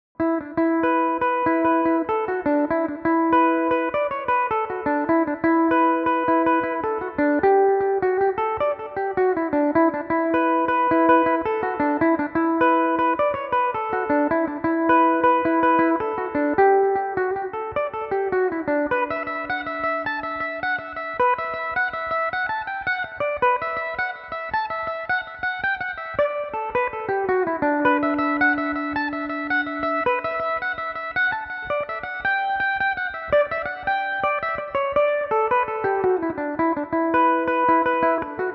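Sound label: E dorian, irish mandolin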